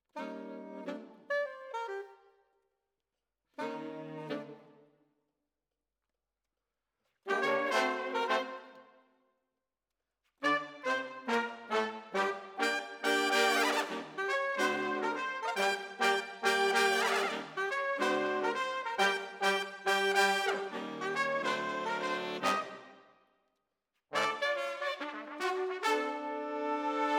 Brass

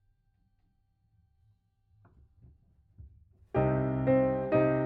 Piano

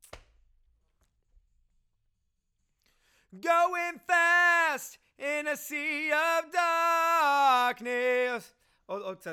Chorus

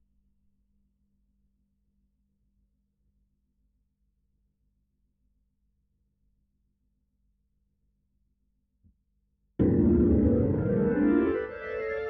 HAMMOND BOT

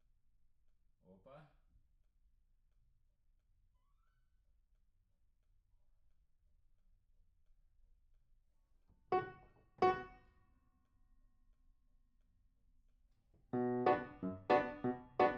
PIANO

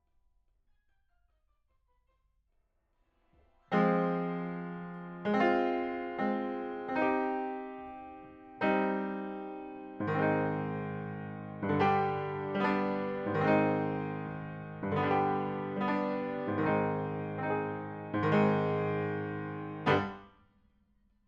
PIANO; TANGO

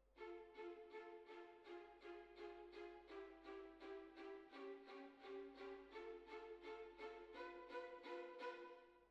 Hall, Jazz for piano

